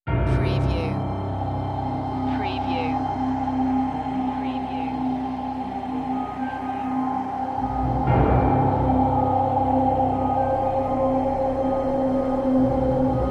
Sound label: Dark Production Texture